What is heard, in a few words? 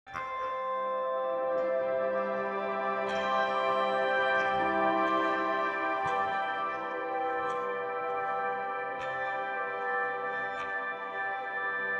ambient